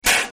Air Mortar Blast From

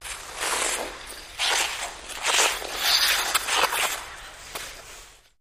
Ice Skating Spins

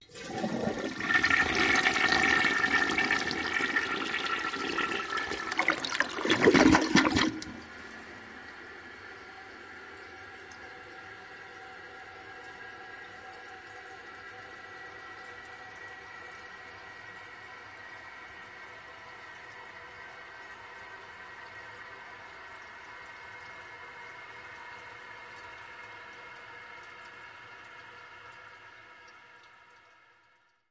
Toilet Flush, Public & Private

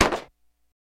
Gun Shot Bullet Impa, dirt

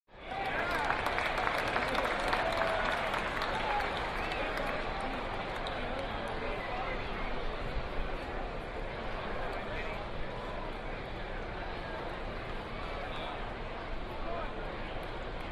Large Crowd Idle

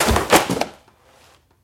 Book Drop Pages Flap

